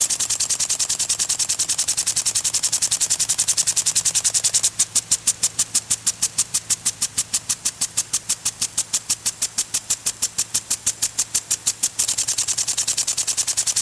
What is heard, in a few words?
Sprinkler Metal